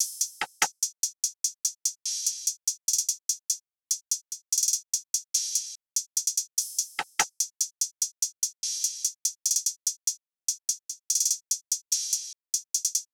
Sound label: Drum Loop 146 BPM Top; Drum Loops; Loop Stems; Cymatics; Mystery Pack Vol 4; Tighten Drum Loop